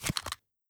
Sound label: foley cloth grab; weapons